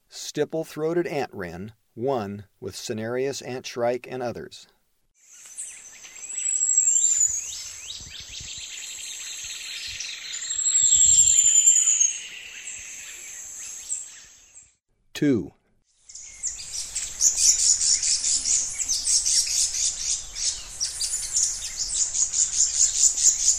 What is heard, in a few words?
Stipple-throated Antwren, S. America Eastern Lowlands